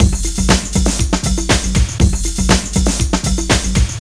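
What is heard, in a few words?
drum loops, right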